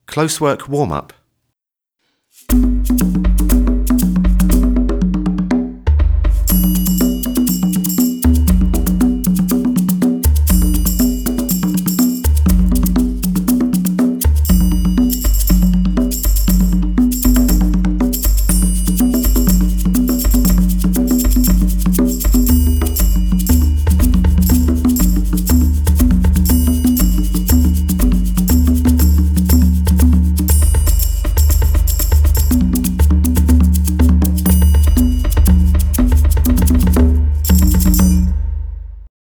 Closework Warm Up

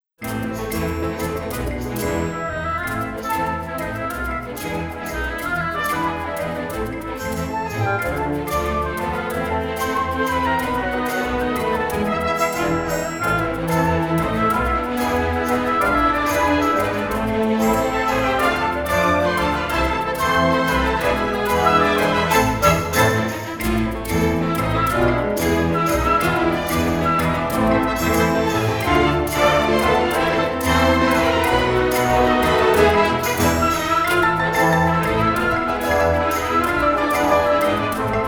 Turns (Continuous), exam music

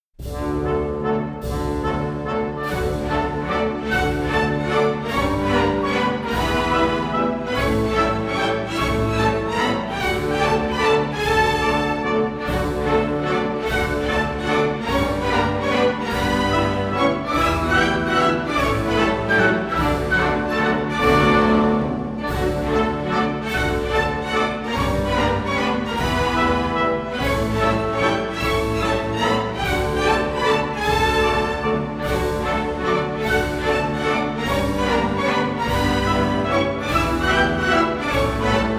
Transfer Of Weight, Waltz

